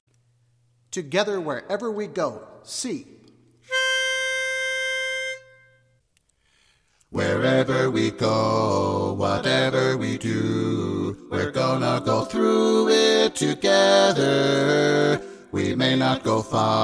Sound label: together bass